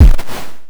Star Instruments Synare PS-1

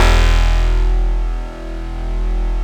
PERC BASS; Synclavier Sampler Library; KEYS AND GUITARS

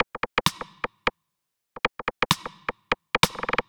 Rimmer, 130 BPM, Percussion; Sonic Foundry (Sony Creative Software); Underground UK House & Electro

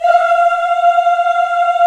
VOX, African Aahs, African Las; Roland LCDP11 Africa VOL-1

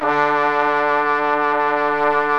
ORGAN & BRASS

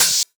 Open HH, Cymbals

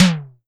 SIMMONS SD-5 drums; SDS5

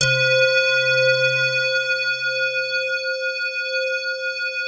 digi pad, Pads

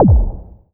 BLIP, Anime Sound FX, SCIFI

